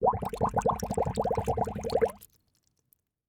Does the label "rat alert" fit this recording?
No